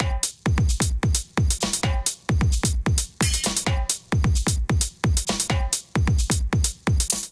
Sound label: Hard Kit, Drums, Basic; MIDI Clips; Sol Hard Kit; 131 bpm; Ableton; Factory Packs; Skitter and Step